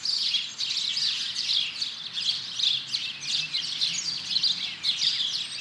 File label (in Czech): ptaci; Mafie 1